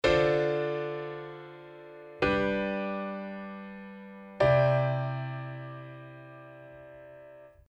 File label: Dm7 G7 Cadd9